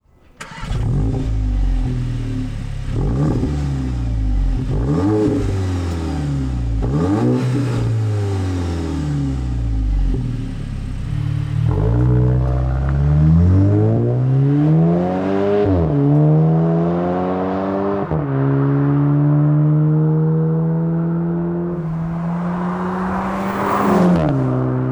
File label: soundcheck